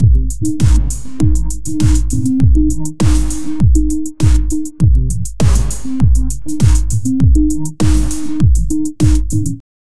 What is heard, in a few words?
muffled club music